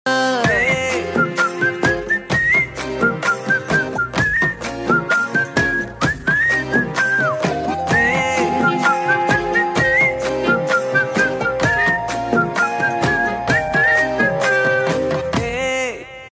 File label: Song